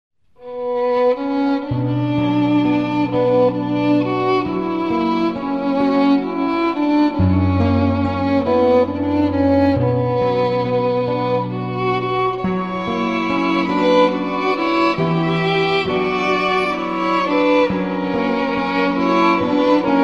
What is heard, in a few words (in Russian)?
музыка